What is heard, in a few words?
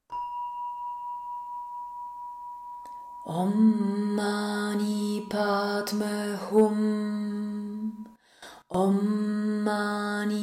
Lotus Padma Mantra